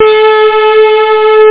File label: pstring